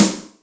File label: RIMrock, Yamaha DTXpress, Acoustic Snare